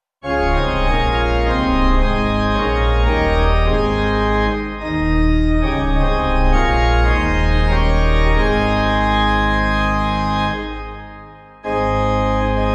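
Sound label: pipe organ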